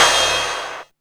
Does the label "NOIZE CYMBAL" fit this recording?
Yes